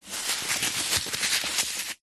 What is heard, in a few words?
office foam packing peanuts handling squeeze; zapsplat